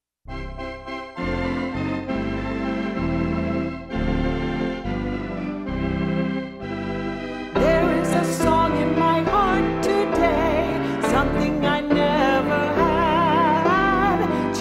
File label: karaoke mix